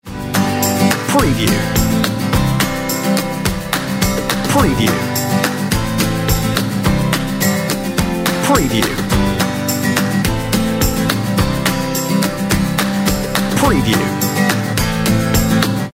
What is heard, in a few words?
On Hold Music Human Rhythms